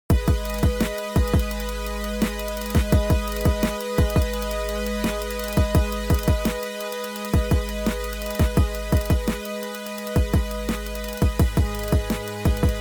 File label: cool hip hop